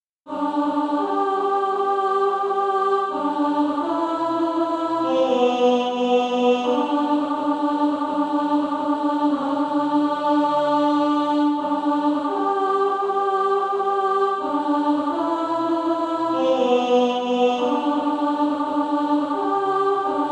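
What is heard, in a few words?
alto, Sheet music (SATB) including Practise Music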